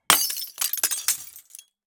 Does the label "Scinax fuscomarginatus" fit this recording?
No